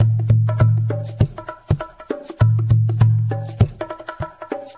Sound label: bata bongoman, drum loops and breaks, 100 bpm; 091 to 100 bpm